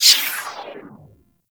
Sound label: Skate Stride; Enemies; Skate Movement; Corpus Modular; Warframe; Corpus Modular Crewman